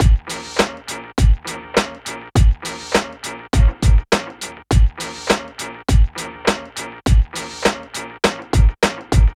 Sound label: Drums Synth Combo, Hip Hop, 102, Bb min; AppleLoops